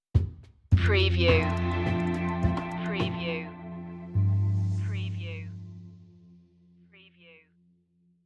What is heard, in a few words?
emerald cascades stinger